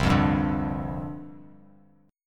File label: C Suspended 2nd Suspended 4th, down; Csus2sus4 strummed